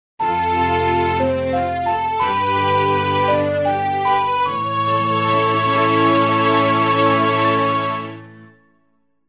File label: Fairy Ocarina Get; Ocarina of Time Original Soundtrack